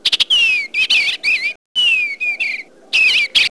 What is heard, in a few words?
eastern bluebird